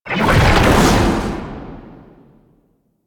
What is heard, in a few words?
Perfect Heavy Attack Activate; Warframe; Weapons; Melee